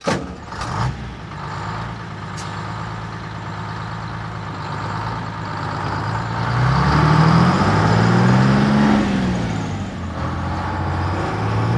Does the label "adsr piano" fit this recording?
No